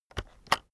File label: keyboard external enter press slow heavy